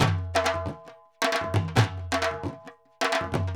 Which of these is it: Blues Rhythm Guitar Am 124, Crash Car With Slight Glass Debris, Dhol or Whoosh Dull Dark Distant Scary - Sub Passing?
Dhol